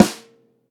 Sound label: Snare